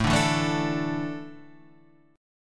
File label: A Suspended 4th A down, Asus4 chord; Asus4 strummed; JGuitar